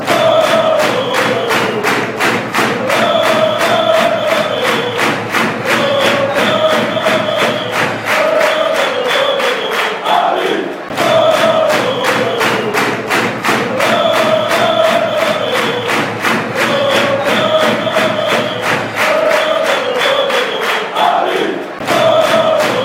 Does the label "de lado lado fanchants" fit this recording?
Yes